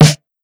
Subby Acoustic Snare D Key, Royality free acoustic snare sample tuned to the D note